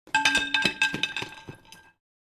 Metal baseball bat dropped

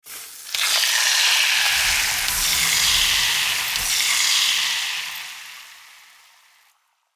Meat sizzling pan cooking; household

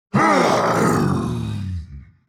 Demon king lord attack shout

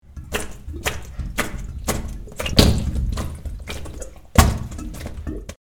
Plumber working water gurgling

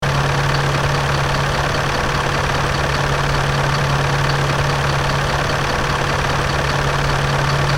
Bulldozer engine idle loop

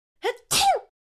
Young girl sneeze

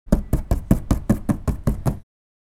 Shower box plexiglass tapping

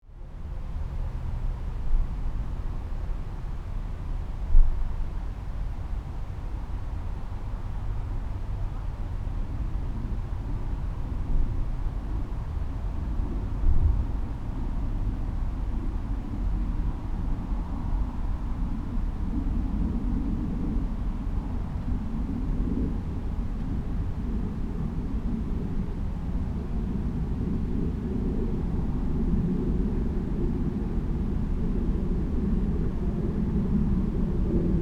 Quiet suburban night ambience

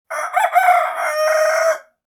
Rooster crowing

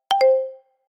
Positive message notification

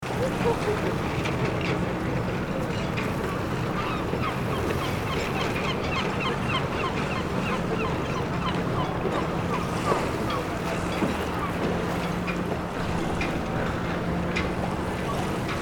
Seaside harbor ambience loop; ship

